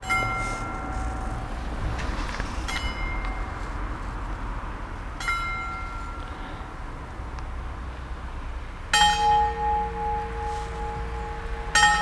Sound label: city hall bells